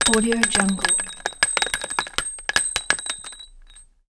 rounds metal drop clusters of cartridges; افتادن قطعات فلزی رو زمین; game sounds

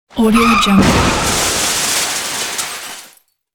crash effect; تصادف و شکستن شیشه; urban sounds

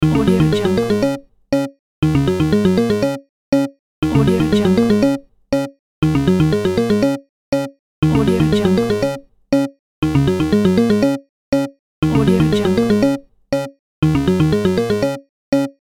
wait load game sequence

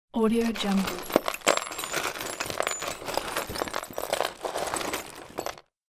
messy drawer browsing; domestic sounds

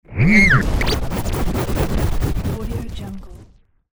dj scratch reveal; transitions and movement

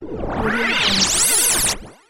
plasma beam; futuristic sounds

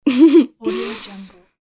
female teen short laugh; human sounds

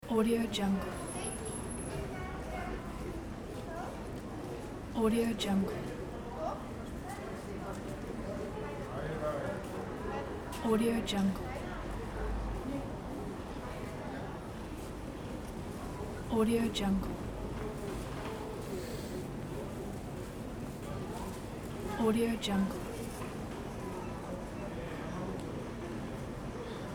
sea town pedestrians chatters busy; nature sounds; صدای صحبت کردن عابران

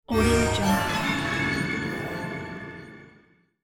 forgotten treasure found; game sounds

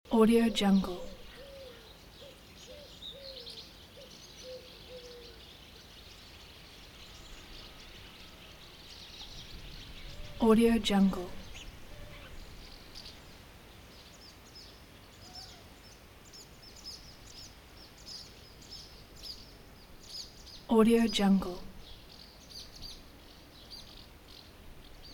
countryside swallows loop; آمبیانس آواز پرستوها; nature sounds

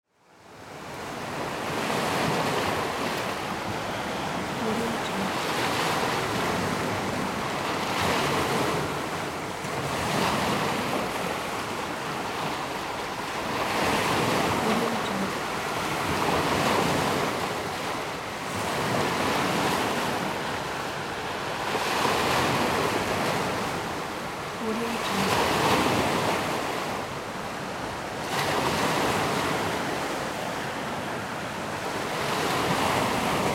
sea waves on a rocky shore

